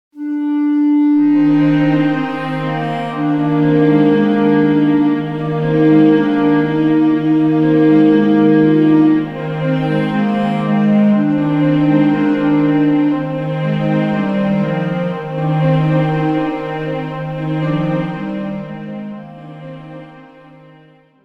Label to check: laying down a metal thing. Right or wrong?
Wrong